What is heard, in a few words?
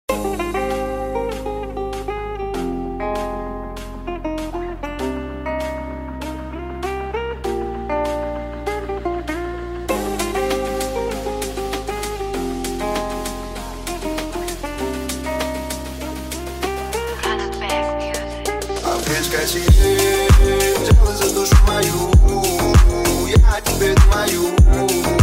remix